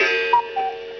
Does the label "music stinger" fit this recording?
No